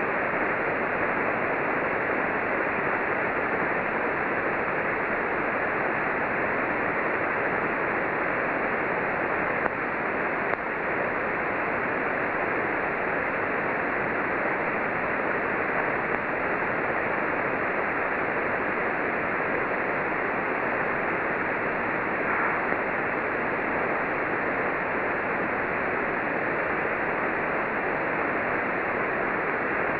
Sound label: 15m FSK441